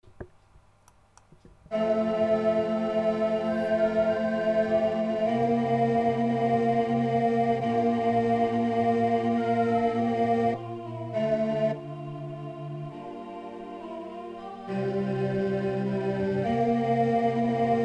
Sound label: tenor